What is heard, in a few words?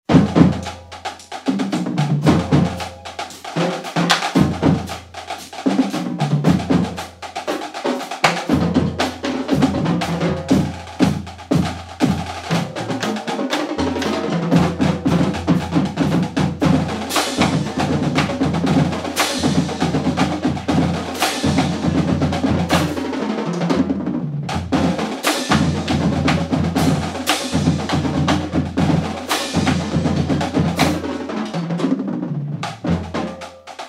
drumline, marching band